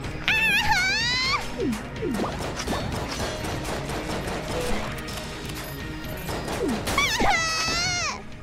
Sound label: cute screams; IRyScream